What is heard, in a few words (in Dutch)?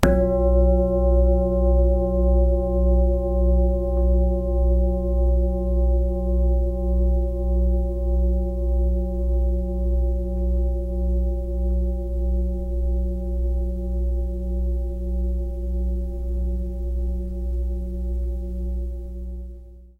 Klankschaal 12.9 kilo